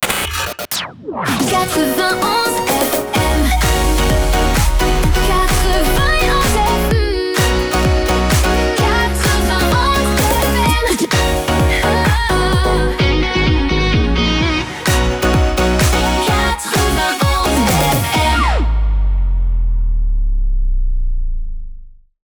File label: Chanté F# 128 BPM Basic ID (No VO); 91FM